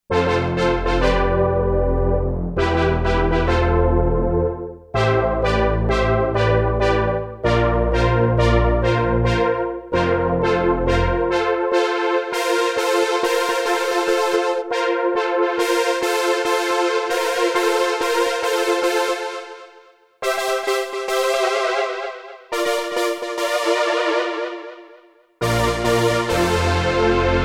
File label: 80s Synth Brass